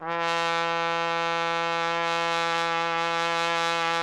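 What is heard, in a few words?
trumpet; Roland - LA Composer vol. 3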